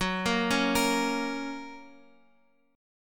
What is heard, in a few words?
Gb Major Gb Guitar Open F; arpeggiated